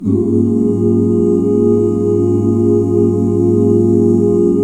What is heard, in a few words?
OOO CHORDS, Vocal Planet, Jazz; ILIO; Jazz & FX